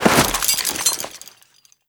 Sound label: player jump; Call of Duty Modern Warfare